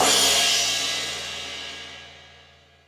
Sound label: Stadium Rox, Drum Machines